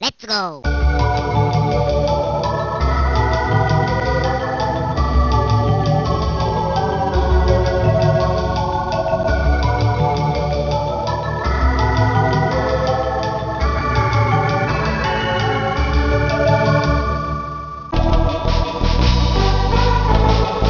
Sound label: Solo